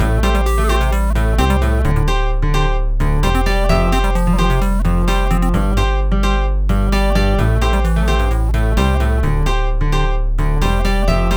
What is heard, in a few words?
Funny ringtone